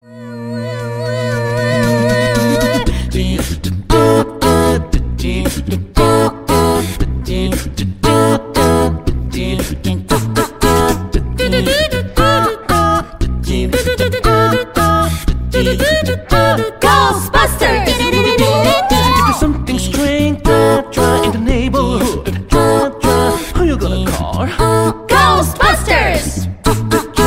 acapella

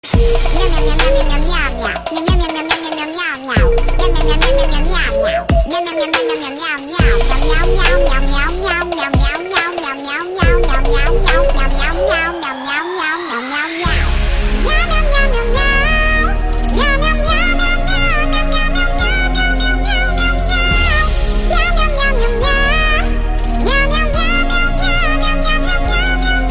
Bongo Cat

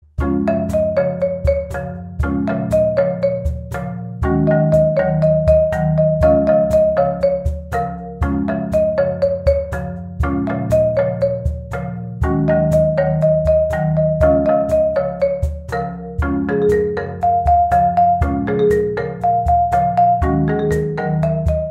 Marimba

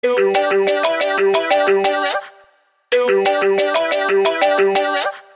UwU iPhone Marimba

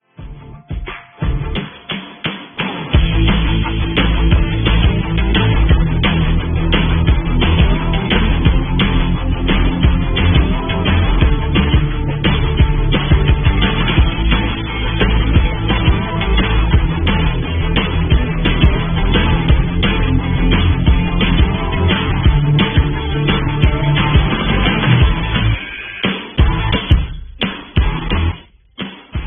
Beat

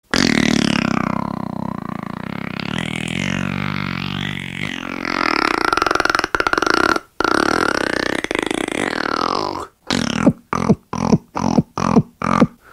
Drill

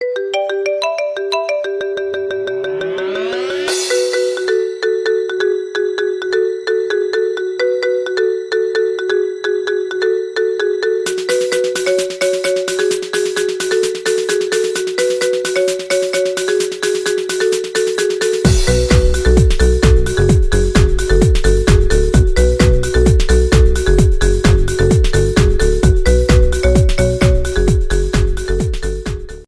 Marimba Remix

